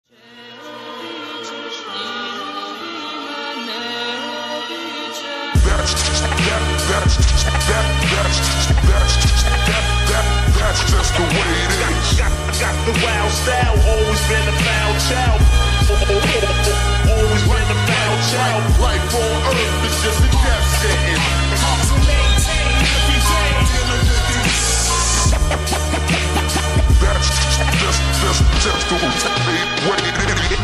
slowed reverb with scratch

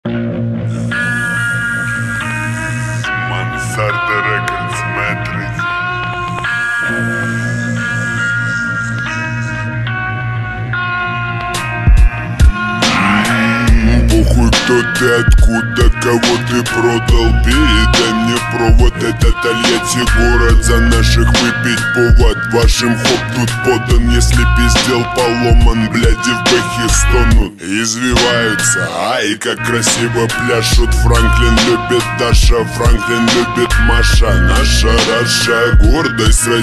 slowed reverb

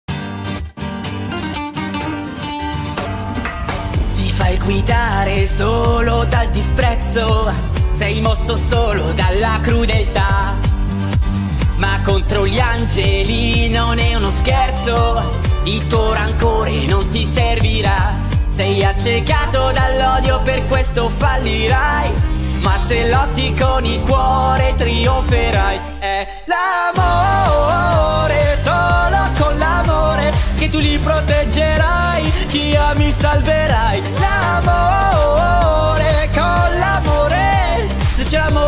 male cover ita; hazbin hotel

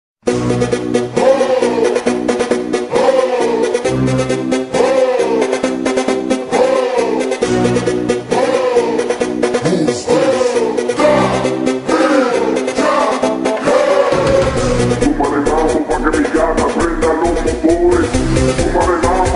slowed reverb

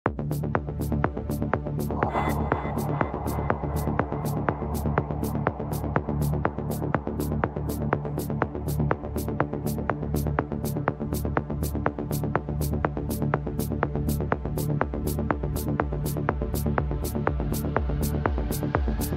original mix, ethno

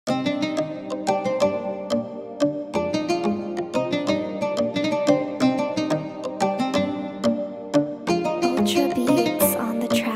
oriental reggaeton type beat instrumental

